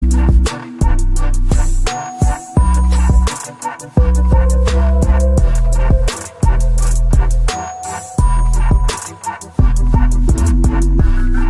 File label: beat; fortnite; billy bounce emote